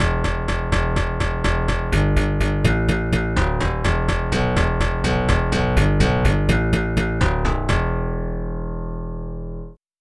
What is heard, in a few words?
pop bass